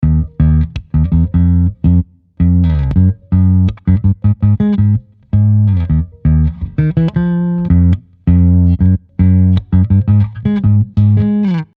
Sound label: real bass